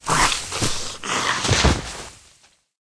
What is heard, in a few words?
vine damage, monster